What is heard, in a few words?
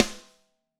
Snare Crack